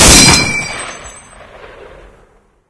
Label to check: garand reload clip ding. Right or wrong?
Right